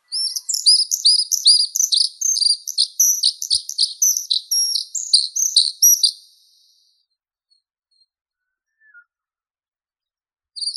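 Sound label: grey-headed redstart, Phoenicurus coeruleocephalus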